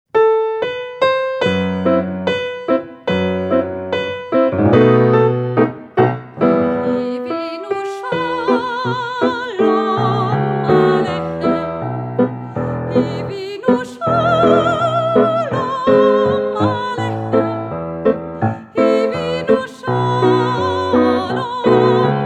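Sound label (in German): Hevenu Hebräisch mit Gesang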